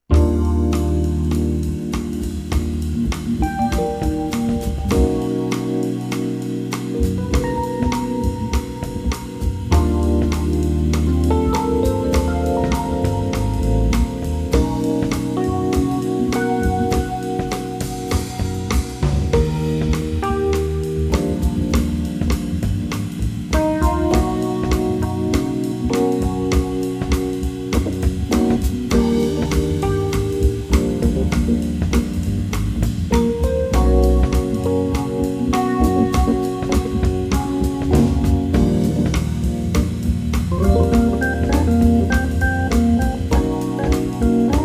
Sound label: Jazz; Sunrise Ocean